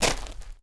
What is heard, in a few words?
dirt run, footsteps; chief